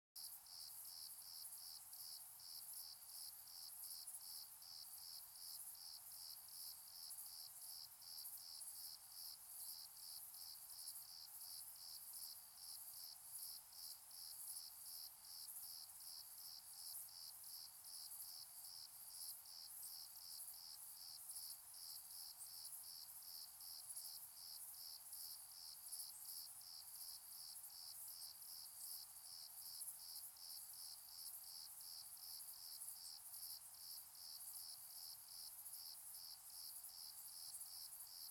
Summer in the Fields - Evening-Night - Distant crickets shrill high frequencies; flat night ambi; Crickets and Cicadas; SculpTunes